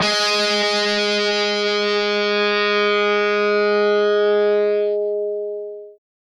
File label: g sharp, guitar